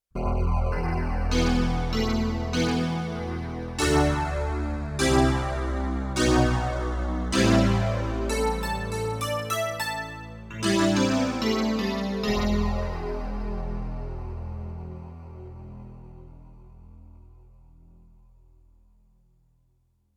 Metal-Clavinet, Yamaha TX16W; Spacey Keys 2

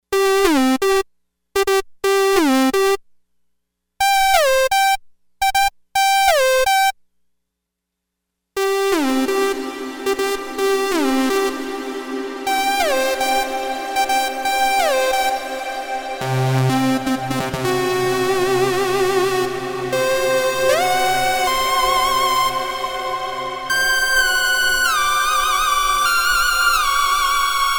Plate Space, Lexicon MPX 1; Factory Programs

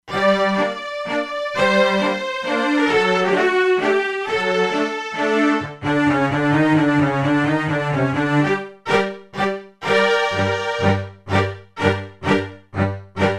Marcato; Factory Presets; E-mu Proteus-2

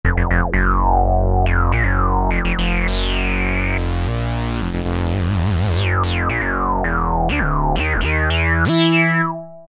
Growl Bass, Alesis Micron; Factory Programs

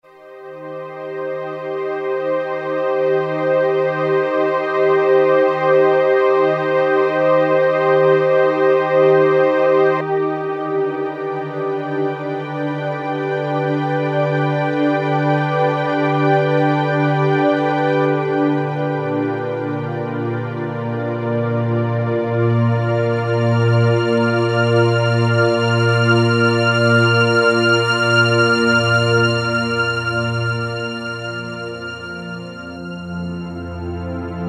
Ambient Pad; Roland M-DC1

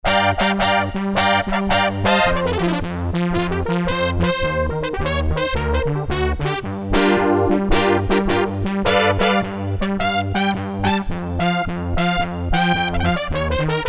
WOWBRASS, Korg DSS-1; Metal-Metalism

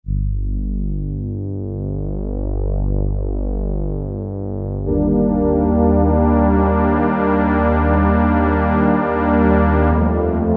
Strings; Cheetah MS6

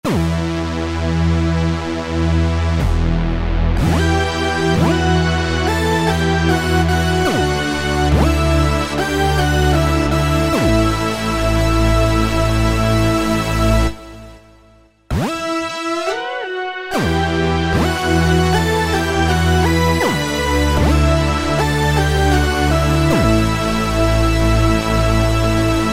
4-SQR PWM, Roland V-Synth; Factory Patches

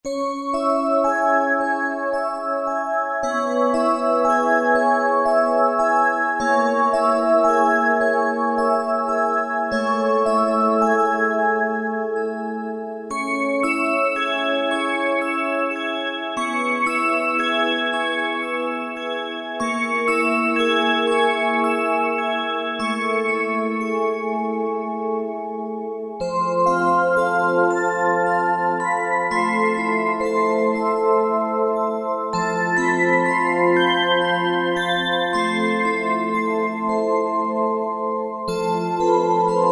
Roland JV-2080; Morning Lite

